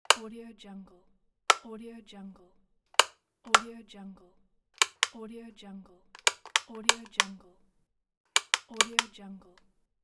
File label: lamp switch